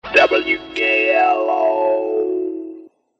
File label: Sono Stab; WKLO